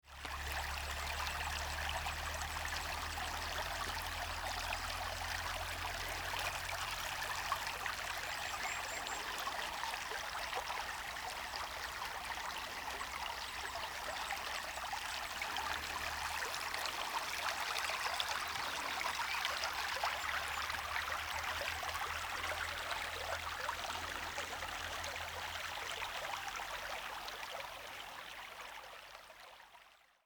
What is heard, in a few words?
running water